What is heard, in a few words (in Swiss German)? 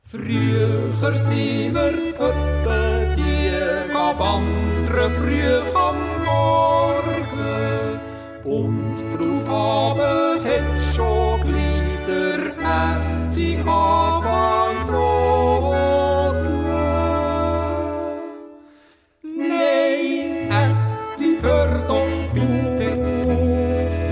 Ätti, dänk a früecher zrügg (Duett)